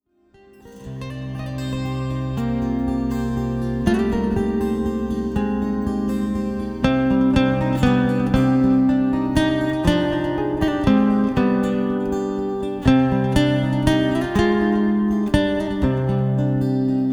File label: Holiday Guitar